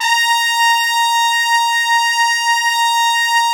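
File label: A#5 TRUMPET; Northstar - New Gold VOL-1; ST.TRMPTS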